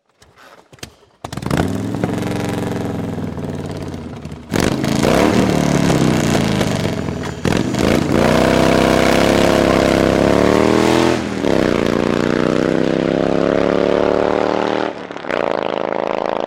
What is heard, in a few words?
Harley Davidson